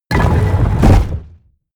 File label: mechanical lever stone medium down